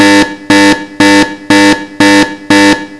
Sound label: alarm bip; de pitit louvre